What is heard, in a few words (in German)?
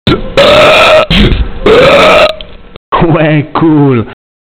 ruelpsen